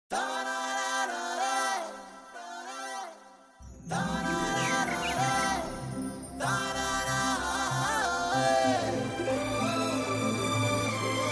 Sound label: Karaoke; Madambi